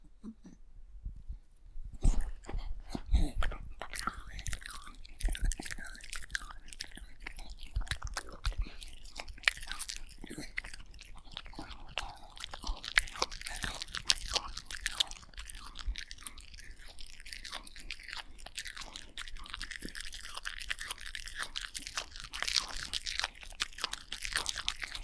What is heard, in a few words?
Eating Dog